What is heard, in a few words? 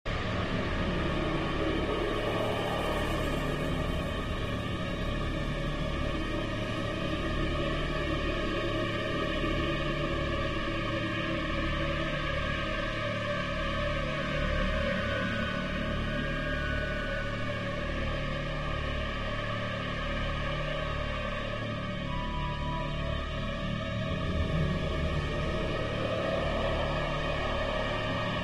Ambient Mission